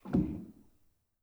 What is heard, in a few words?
rel, Grand